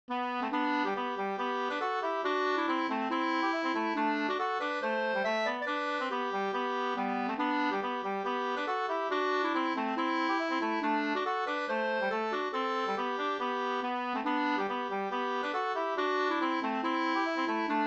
schottis; Thureholm Sd

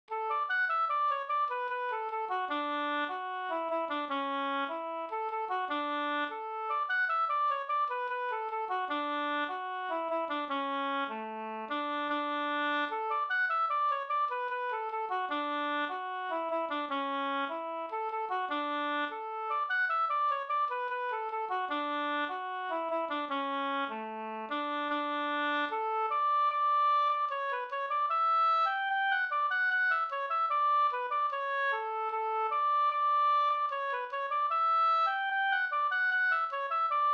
reinlender 4-4

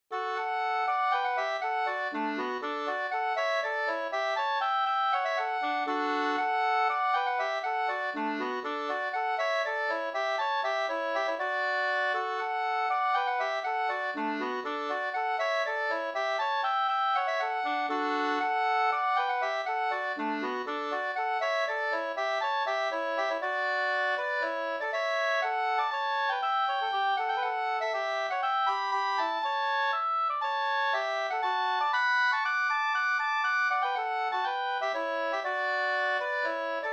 polska, 2st; e Gustaf Johansson Olstorp Floda

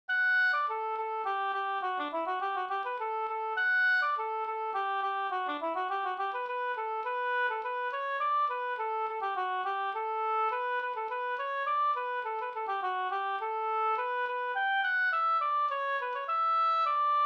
slangpolska

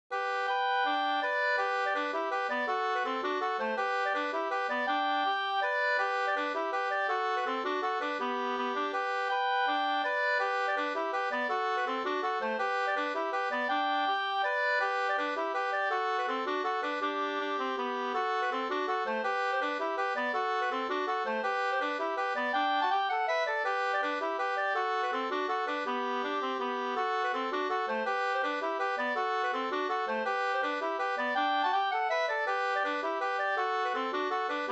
mazurka, 2st; fr Ersnas Nb

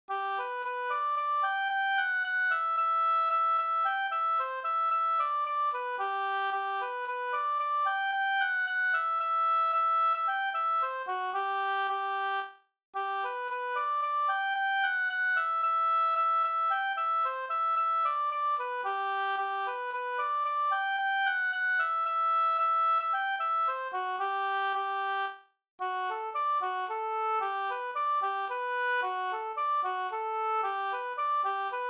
slängpolska

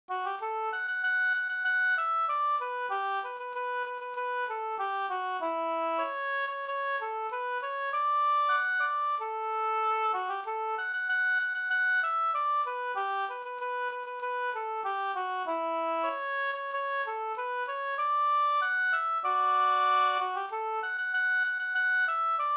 brudmarsch; Laske harads brudmarsch

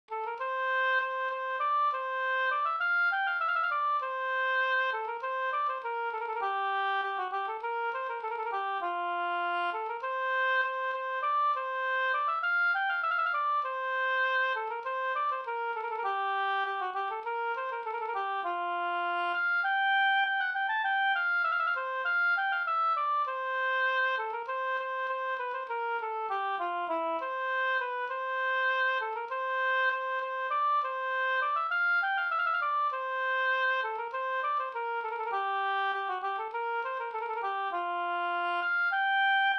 snoa, F dur

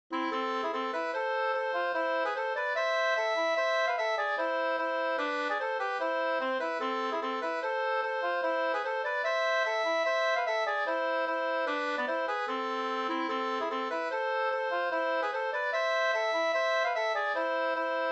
reinlender

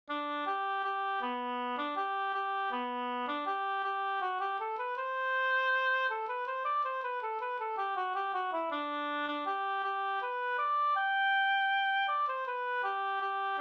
brudmarsch; Lerbomarschen

